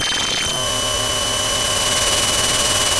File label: Sound of a cat meowing